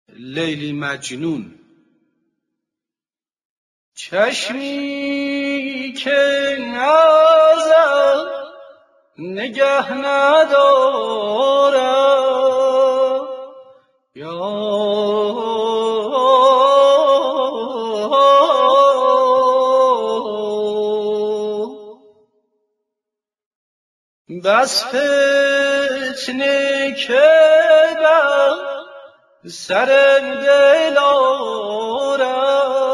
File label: leyli majnon